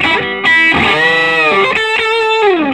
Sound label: Vintage Blues Guitar, SOLO